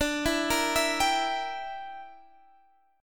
G Minor 6th D

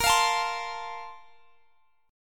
Bb Major 7th Suspended 2nd, Guitar, Standard; x,x,20,22,22,20; strummed

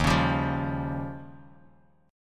Eb 9th, Bass Standard; strummed; JGuitar

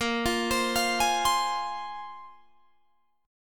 Bb 7th Suspended 2nd Bb, Guitar Drop D

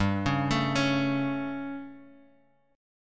G Suspended 2nd Flat 5th G, Guitar, Drop D; chordshape; down

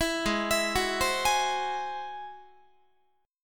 Ab Augmented 7th Ab down Guitar; Ab+7 arpeggiated; JGuitar

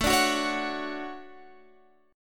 D Major 7th, chord

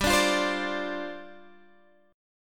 G 7th Suspended 4th G, Guitar Drop D; down

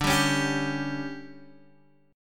D Major 7th Suspended 4th, D, down, Guitar Open D

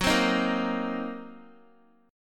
Gb 6th Flat 5th Gb down Guitar; Listen to Gb6b5 strummed

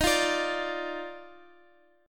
Eb Major 7th Suspended 2nd Eb, Guitar, Open F; strummed; x,x,x,10,8,9; EbM7sus2

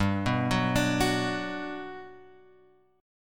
G Major 7th, G; GM7 Chord {3 2 4 X 3 2} arpeggiated

